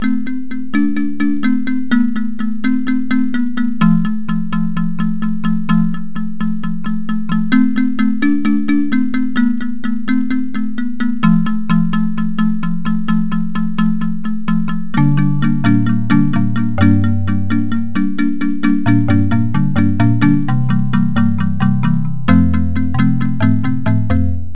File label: Time Music; Bratz Super Babyz; NDS